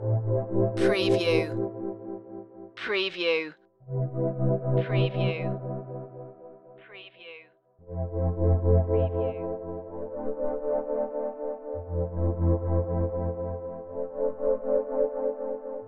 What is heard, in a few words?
dreaming underwater loop